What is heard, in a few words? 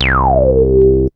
BASS; SoundFactory; SMPMANIA